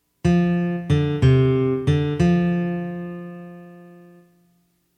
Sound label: melody recognition